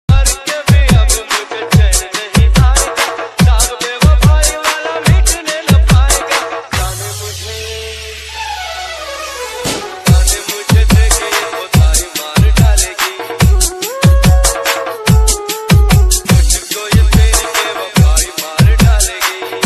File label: hindi instrumental ringtone love ringtone sad ringtone